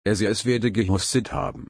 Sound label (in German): husten